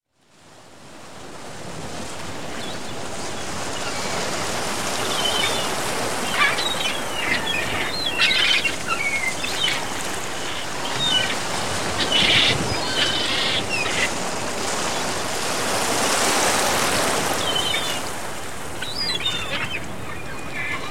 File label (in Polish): Morze